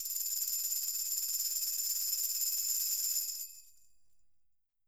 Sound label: tamb, PERCUSSION